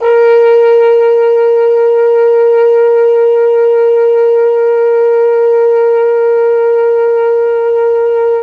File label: A#3 TROMBONE, Mellotron; CHMB TRMBONE; Mike Pinder - Mellotron Archive CD